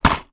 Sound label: household medicine tablets pack foil box down on table; zapsplat